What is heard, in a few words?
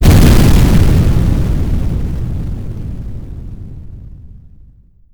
explosion; arms